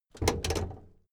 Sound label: car camaro open hood